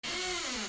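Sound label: creak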